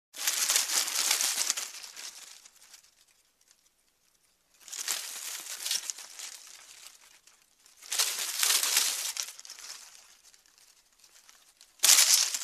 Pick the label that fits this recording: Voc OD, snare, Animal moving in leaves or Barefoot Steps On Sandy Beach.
Animal moving in leaves